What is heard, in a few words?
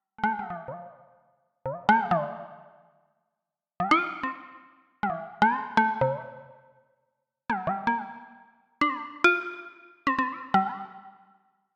Frog B Raintune; Proteus